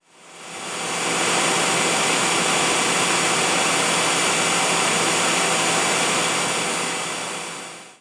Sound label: vacuum